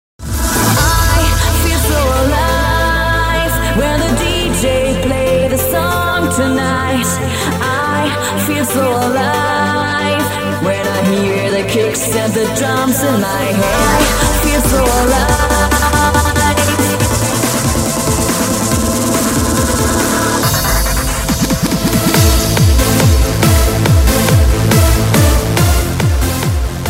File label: remix ringtone